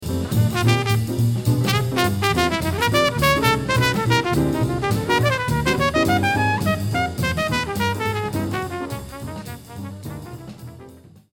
solo

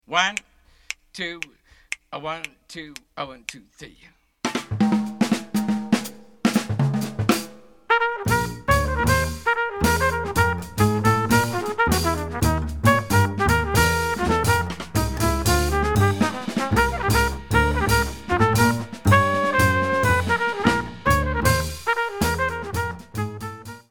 med up minus Piano

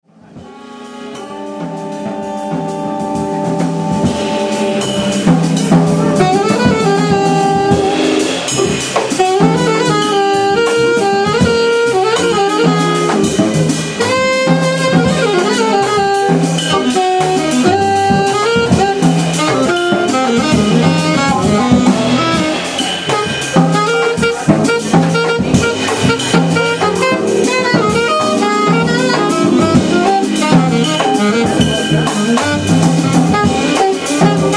solo; 55 Bar

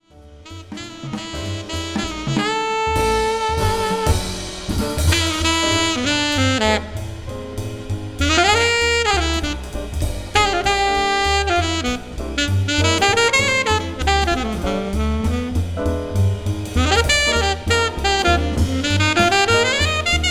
alto solo

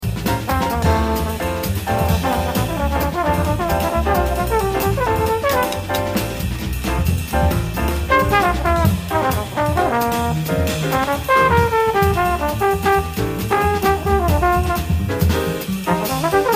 solo

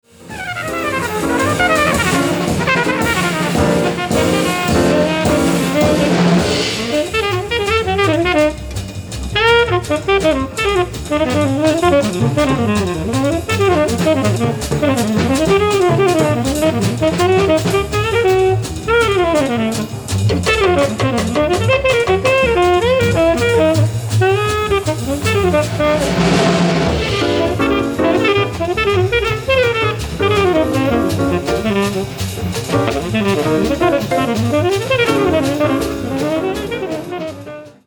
solo

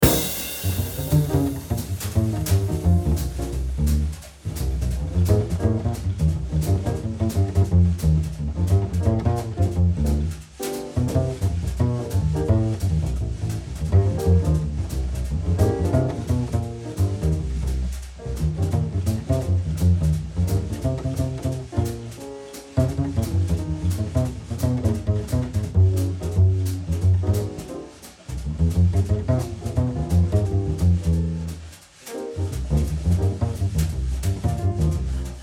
Bass Solo; solos for two choruses

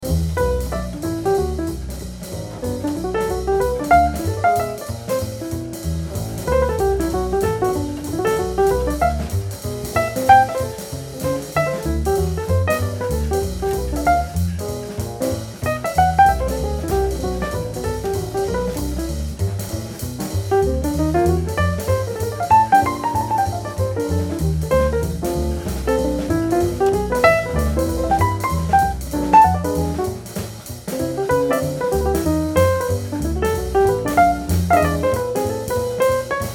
bass line behind solos

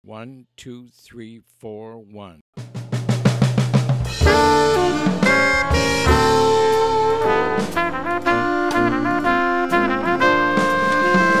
minus Bass

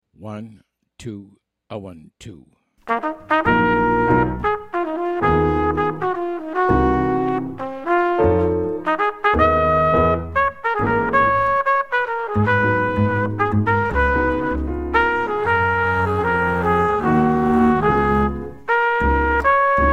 minus drums; sticks throughout; count off sets up the melody

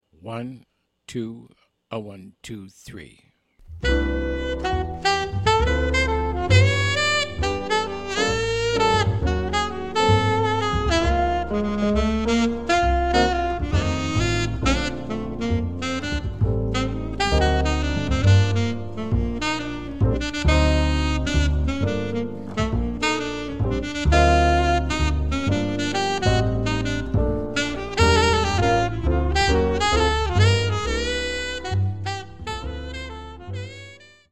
minus drums

